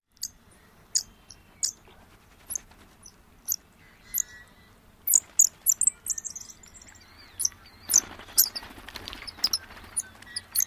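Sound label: white-rumped sandpiper calls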